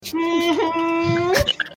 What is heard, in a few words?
uhummmmmmm